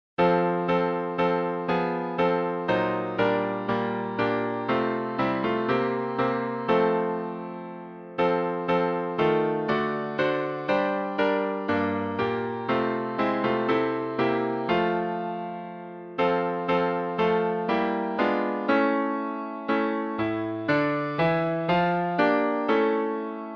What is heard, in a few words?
Hymns